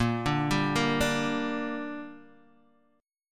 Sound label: A sharp 6th, Guitar Open D